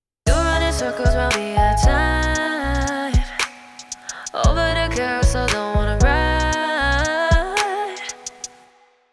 Seq Off

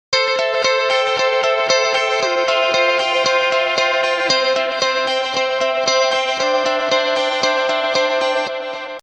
guitar pingpong

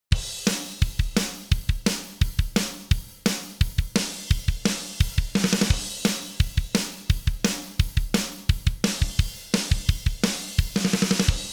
drum On

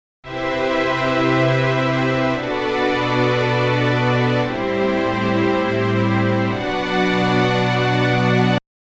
pad off

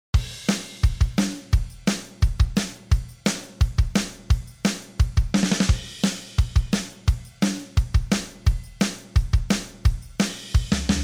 Dyna Mu Drum Off; t-Racks

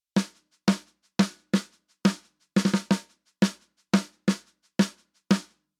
snare on

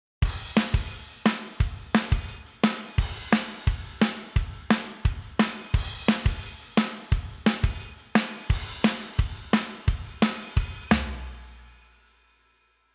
drum on

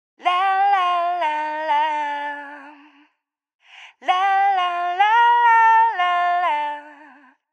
Vocal on

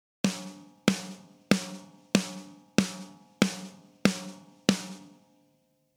snare comp on

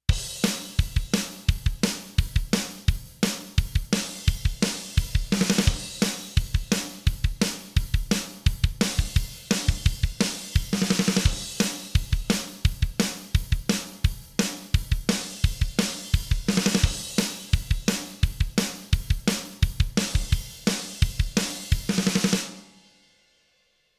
drum Comp Off

